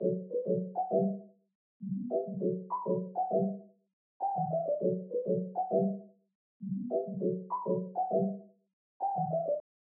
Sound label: 100 BPM D Maj Backup Synth, loop stems; Cymatics; 2022 Melody Collection; Islands